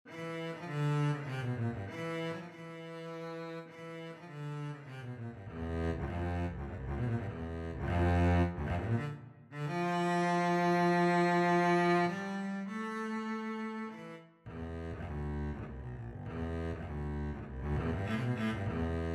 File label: debussy syrinx, Double Bass